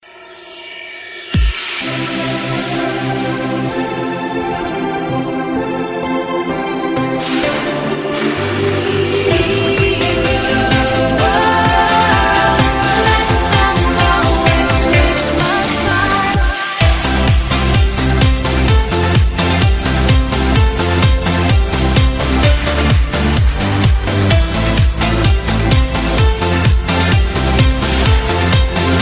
christmas band dance ringtone

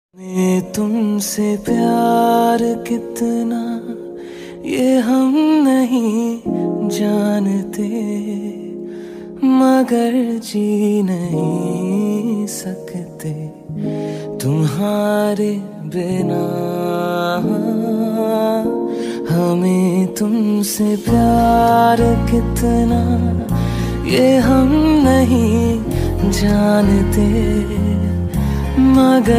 song

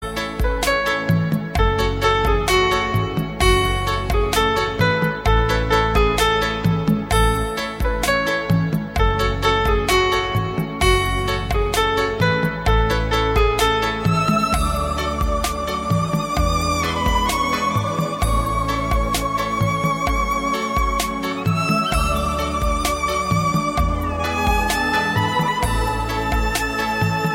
saudager instrumental ringtone

